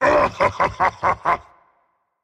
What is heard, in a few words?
laugh rage; chaac